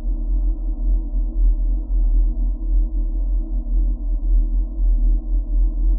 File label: InfiniVerb Voice, sparse soundscape Loops